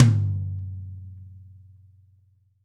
TOM L, LA TOM

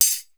crashers, steel percussion; E-MU Producer Series Vol. 7 – Old World Instruments

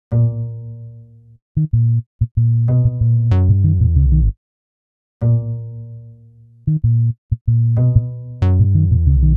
Bass Combo

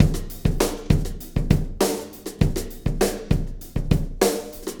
Wormhole Beat, Processed Drumkits

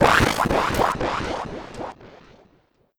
Metal; Sonic Foundry (Sony Creative Software); Esoterik Beatz; Mecan FX

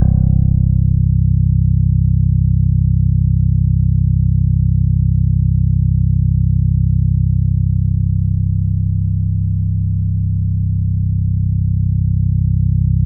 C1, SynthLine; 90 s Sample CDs; NiceBeats; SynthLine VOL-33

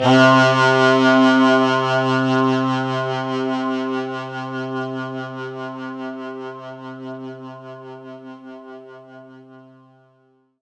C3, SynthLine

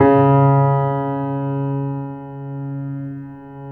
PED MF C, GRAND PED; Best Service Advanced Media Trax Modern Composer

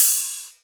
Rides, Cymbals

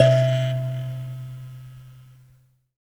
BUZZ, Buzz Kalimba; Roland LCDP11 Africa VOL-1

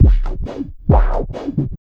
FX, Big Beat Drumloops, 135

